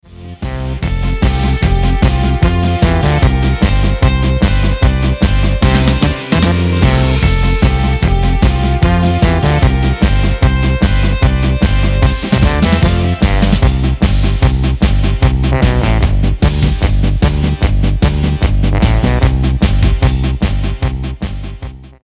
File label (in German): Polka G C F B